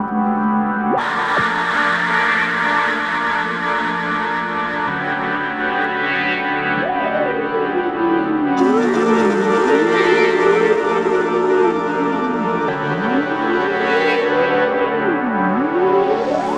pad bus orig

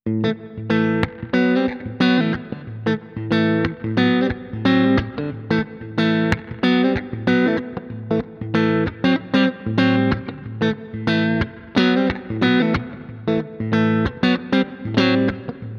Valeton GP 200LT Comp Clean

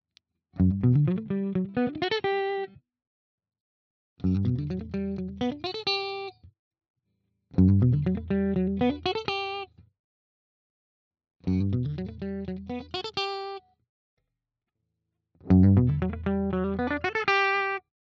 JACKSON AS Virtuoso SHP clean Arps all positions

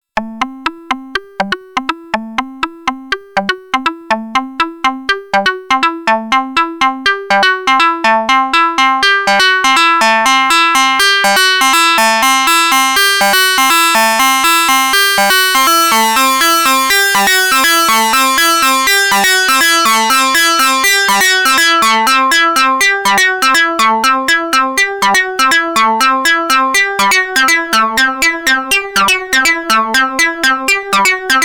Sync PWM

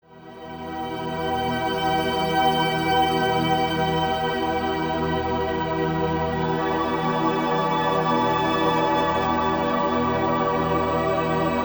Stylophone 350 S Delay; Polychrome

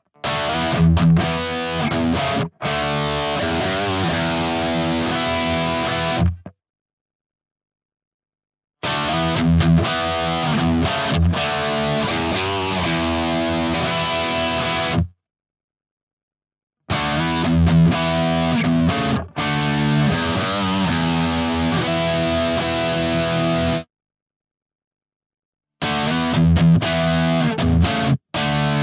All positions Heavy Chords; DAngelico

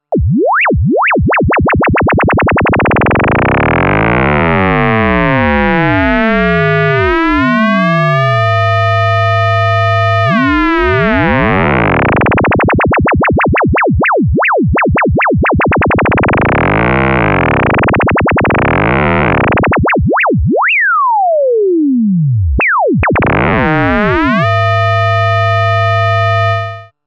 Waverazor Dual Oscillator FM Sine OSC CV In; MOK

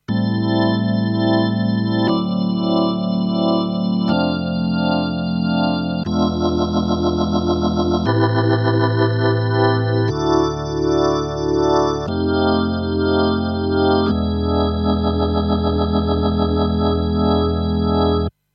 E Organ Neo Ventilator II

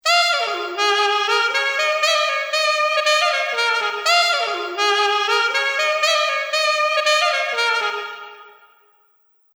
Sax Ambience

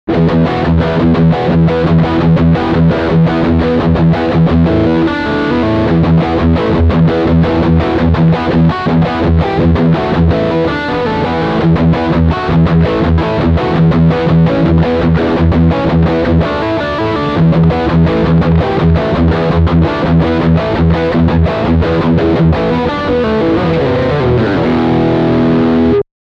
Riff

